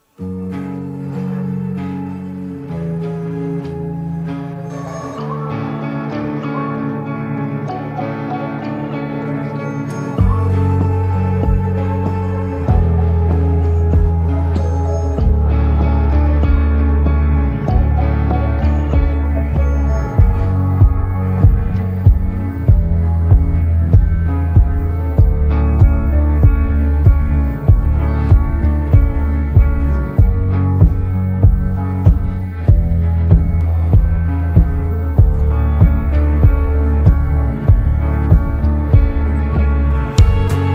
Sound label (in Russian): instrumental minusovka